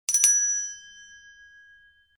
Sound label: Tuning Fork; Coolpad; ESurfing-No1-2021